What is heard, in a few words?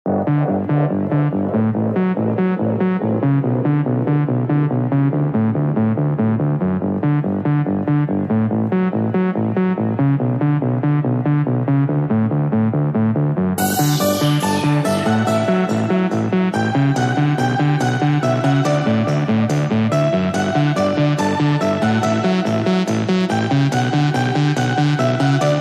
bomb